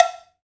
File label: PRC COW SML, Cowbells; Roland - Rhythm Section; Latin 1